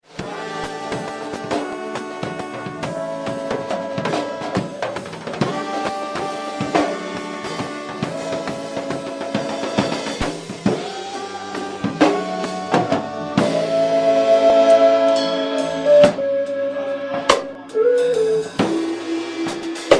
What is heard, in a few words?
Rehersal